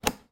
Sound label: lock